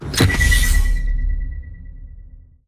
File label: Map Hack Radar Pulse; Burn Card; Titanfall 2